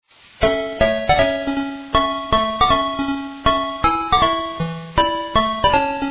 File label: Italian Anthem